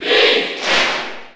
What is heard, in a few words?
Cheer French; Pit; SSB4